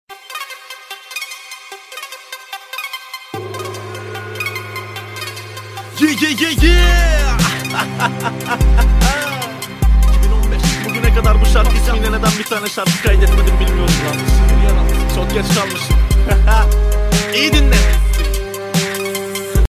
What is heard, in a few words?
hahaha; joker